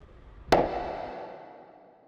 Snares & Claps, One Shots

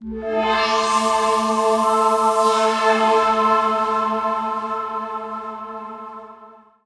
TECHNO PAD 140; Keys

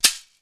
SHAKER; drum; PERCUSSION

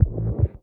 One Shot, Hits

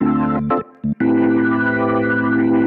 ORGAN 90 A; old rec

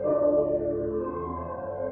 SYNTH PAD ETHNO 125 A, Sphere

